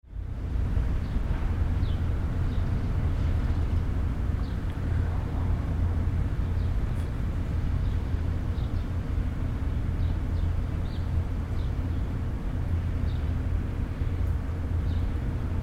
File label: Alley Tone Urban Air, General city back street ambience. Distant traffic.; City Sound Effects; Some birds; LYN105 package of City Ambiences